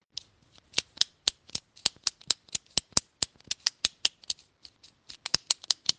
Kugelschreiber

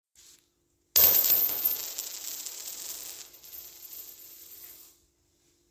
Muenzen fallen auf den Boden

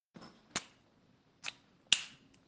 Fuellerkappe

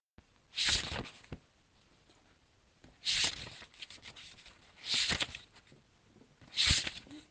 Buch durchblaettern

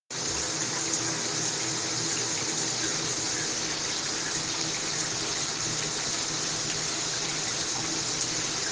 Klopfen an die Tuer

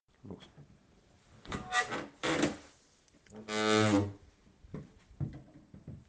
Schranktuer

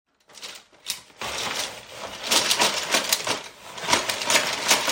in Stiftbox wuehlen; In der Stiftbox wühlen

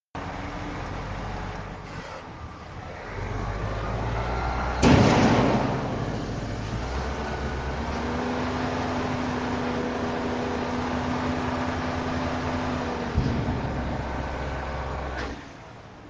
LKW

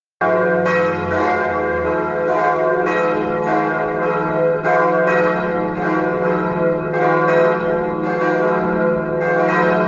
Kirchenglocken

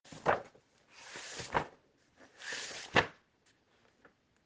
Buch

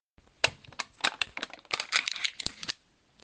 Joghurtbecher

